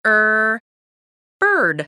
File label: BIRD